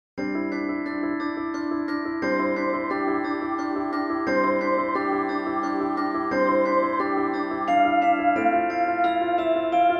tutti; Sanctus

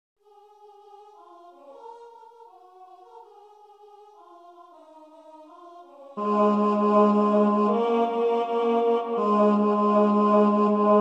Bonse Aba, bass, choir

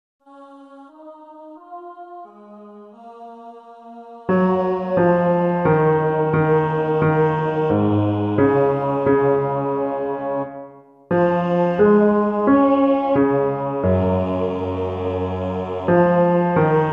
bass

